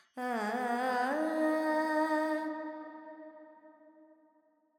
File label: Verb Phrygian, Vocals, Carnatic Female, Alaps; Sample Banks